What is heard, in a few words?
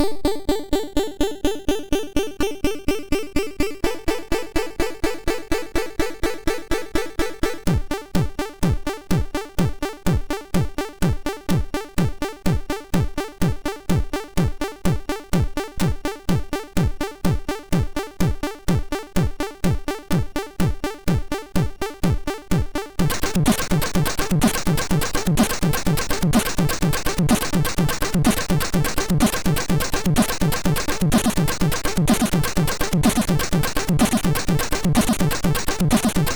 Tekkno Power, MOS6581R2